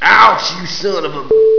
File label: pain; quake2; dung